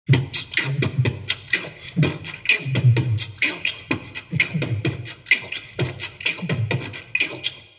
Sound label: beatbox 16beats; temple